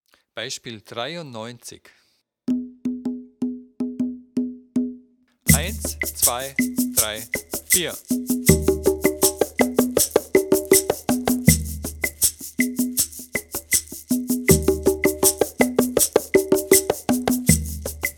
viererrhythmus

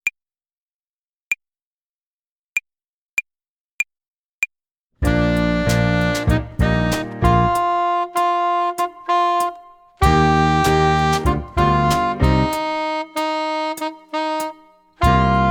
tempo und rhythmus uebung hihat play along

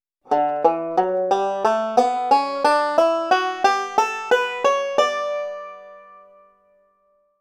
d dur tonleiter

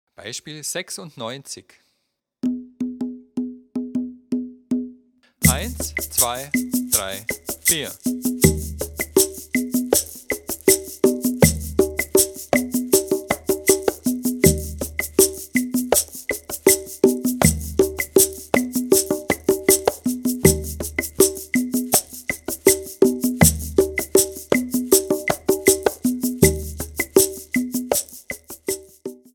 viererrhythmus

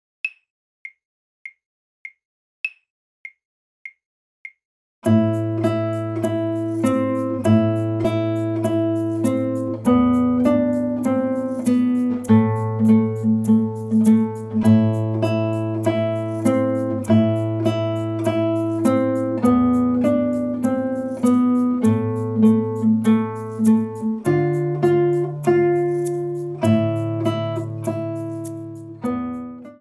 melodie aus russland

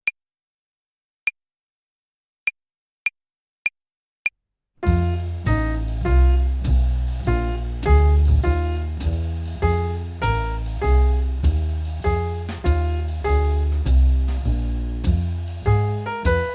blues tonleiter uebung play along slow

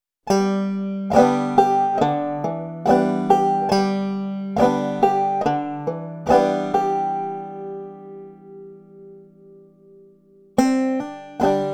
bum ditty mit hammer on

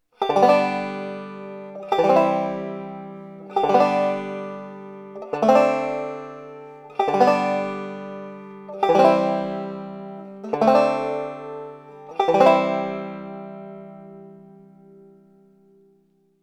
schnelle akkordwechsel

